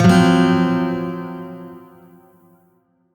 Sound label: Shawzin Hirajoshi Chord F; Warframe; Lotus Shawzin